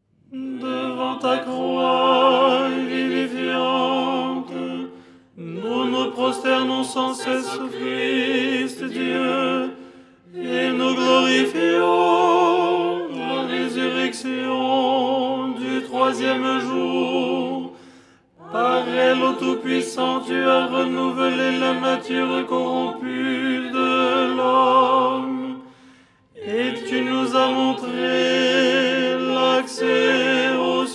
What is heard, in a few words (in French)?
tenor; Au lucernaire, 1ère stichère du dimanche; Les 8 tons en français; ton 4